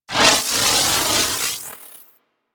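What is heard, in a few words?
Crewship Missile Swarm Projectile By; Vehicles; Crew Ships; Weapons; Warframe; Rail Jack; Corpus Crew Ship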